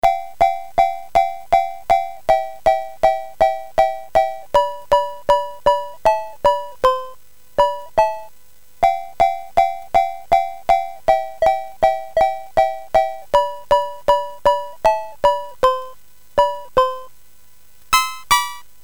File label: BASIC sid CSG8580R5, GAMES; Shooting Gallery